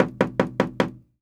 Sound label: knock; AKAI S-Series CD-ROM Sound Library VOL-6